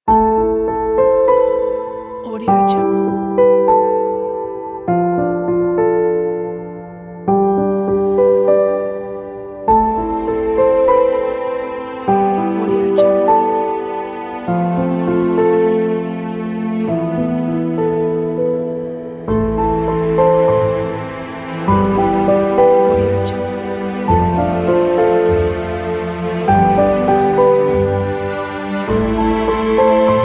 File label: emotional romantic story; melodrama studio